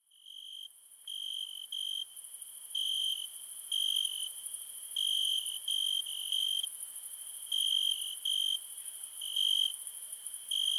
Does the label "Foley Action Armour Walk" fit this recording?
No